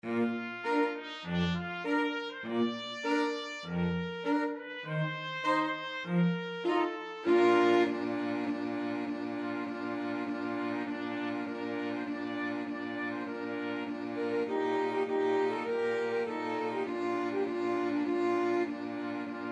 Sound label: flexible mixed ensemble 4 players